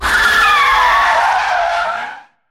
skid

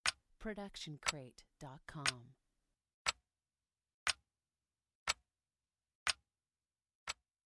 Mechanic Clock Ticking; soundscrate

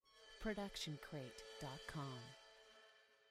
crash cymbal 16 inch scrape; soundscrate

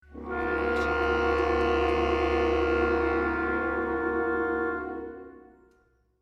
Low cluster dim; SOUNDSCRATE

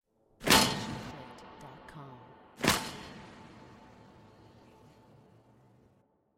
designer breaker switches; SoundsCrate

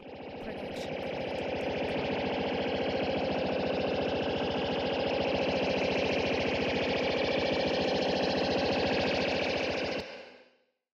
anime energy rise long

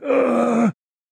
man injured groan; soundscrate

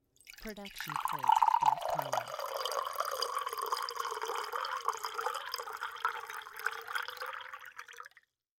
liquid cup pour; soundscrate

